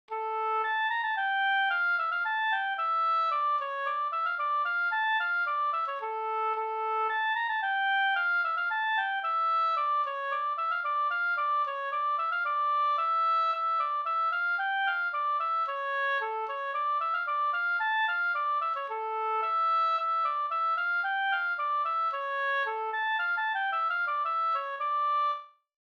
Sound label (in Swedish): polska Springedansen